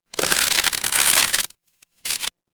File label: paper fabric crumple